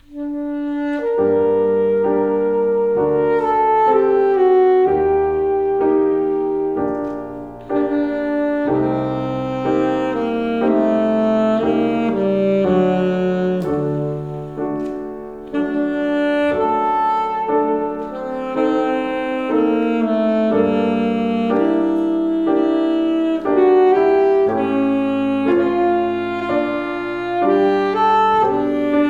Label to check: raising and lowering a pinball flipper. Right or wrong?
Wrong